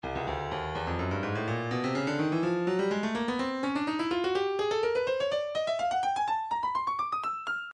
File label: stylus breakbeat midi files 125 bpm; Cooked C